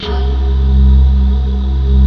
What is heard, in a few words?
FLUTTER PDS; TR PADS; Pro-Rec - Synthsonic